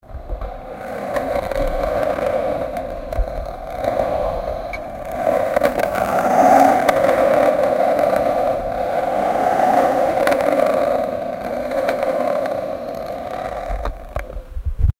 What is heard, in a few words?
riding on a skateboard